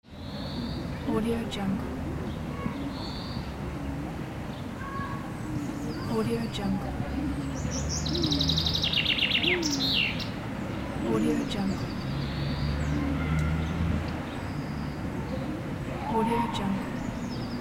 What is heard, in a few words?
calm city